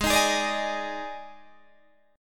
D sharp Major 7th Suspended 4th G sharp

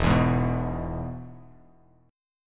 E-Major E, Bass Standard; strummed; JGuitar

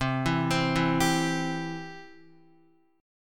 C Major, Guitar; arpeggiated; JGuitar

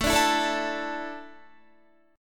A Suspended 4th, Guitar; Asus4; strummed

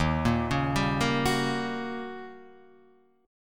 D sharp 7th Sharp 9th Guitar Half Step Down

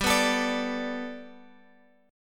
G Major Add 9th Guitar Open F, chord; Gadd9; strummed

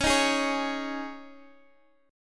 C sharp Suspended 2nd

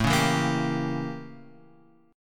A 7th Flat 9th; A7b9 Chord {5 4 2 3 X 3} strummed

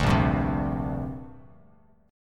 A sharp 6th Add 9th, Bass Standard; strummed; JGuitar

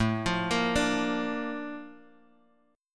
A sharp Major 7th A

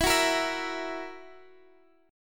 E Suspended 2nd Flat 5th E down Guitar Open D

